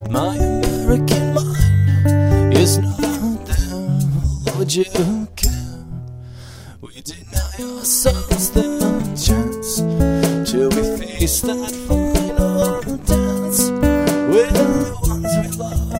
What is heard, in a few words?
backing